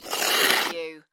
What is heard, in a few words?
Snow and Ice, metal slides on ice